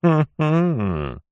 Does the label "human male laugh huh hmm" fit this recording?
Yes